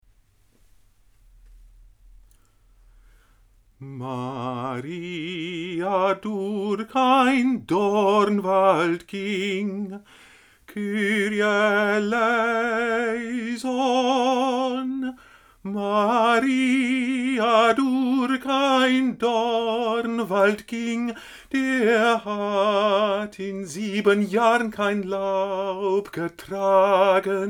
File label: tenor